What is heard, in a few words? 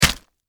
Impact Splat Crunch Flesh; Splats; Objects; SFX Bible